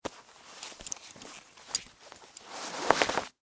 Foley, Clothes, Belt, Take, Off; Dressing; Human; BlastWave

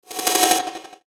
Chopper Fast Attack Soft Reverb; Blastwave; Chopper Sound Effects

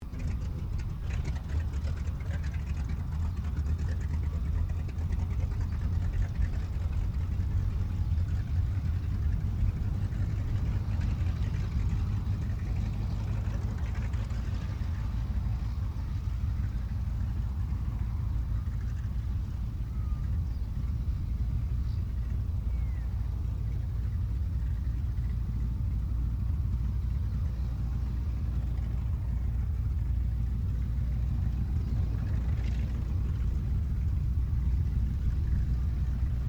AIRCRAFT CAC WIRRAWAY STARTUP DISTANT; CA-16 Wirraway; Airplane; Vehicles; Airplane Sound Effects

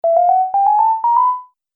Multimedia Music

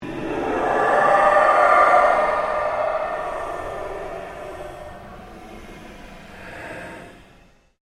Ambient Pulse Ghost Chatter

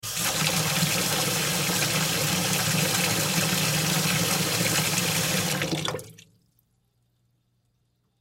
Utility Sink Run, Utility Sink Run Water Short; Household; Laundry

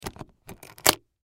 Latch Unlock Open; Latches; Foley; Latch Sound Effects; Objects; Blastwave

